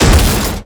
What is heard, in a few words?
Car Crash